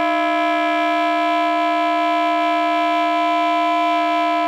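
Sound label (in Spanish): Complejos, saxofón multifónico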